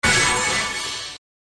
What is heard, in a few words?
summon sound effect; yu gi oh